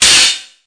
HHO, Volca; drums; hip hop; WallsVado Volca Sample Pack

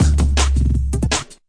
rollin break, drum loops and breaks

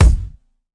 floppy bounce kick

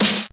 c64 sid, Commodore 64 8bit SID Drums; LO FI and 8 BIT KITS

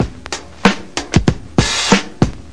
olskooly vinyl, drum loops and breaks, 095 bpm; 091 to 100 bpm

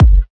pure deep kick; Kicks; single hits

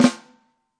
real snare, REAL LIVE KITS; A Real Freakin Drum Kit

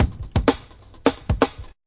128 bpm sharp snare groove

